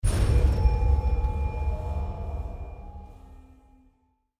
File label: Metal Altar; Blind Quest - The Frost Demon